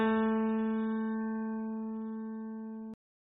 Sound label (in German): Ton, Klavier (einzelne) Töne